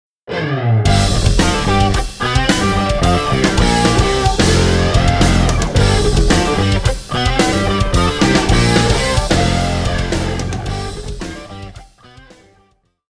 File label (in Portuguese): Riff com Flanger